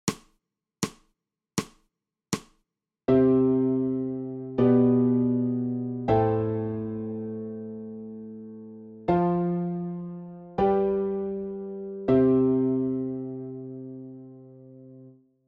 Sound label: ALTO